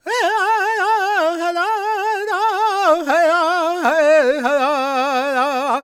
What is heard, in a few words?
C# MAJ, World Voices, LAKOTA; Spectrasonics Vocal Planet CD5